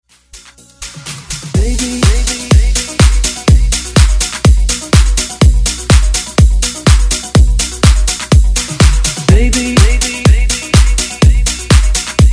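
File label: house extended redrum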